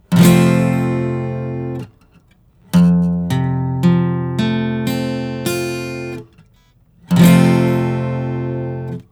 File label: Major or Minor